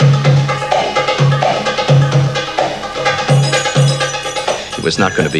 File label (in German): STOERUNG, transwaves; Soundengine - CSR-000 The Art of Transwaves